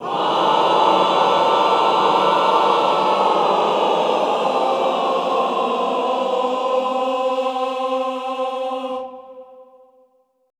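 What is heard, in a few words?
Cluster Unison C3, Classical Choir; Best Service; Extended Classical Choir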